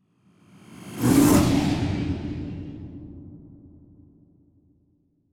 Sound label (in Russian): energeticheskaya pushka zapuskaet zvuk speceffektov